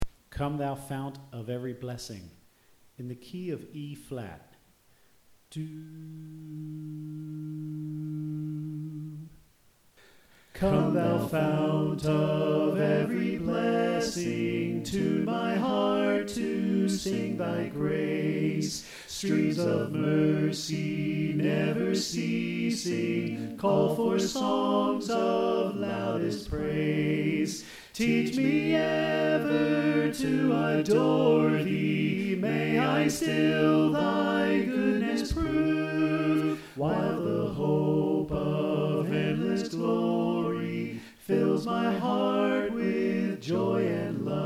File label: Soprano Left